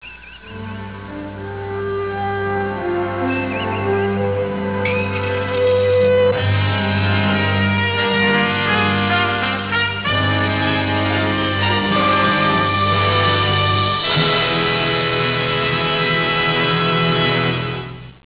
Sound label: music